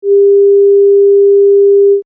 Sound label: oscill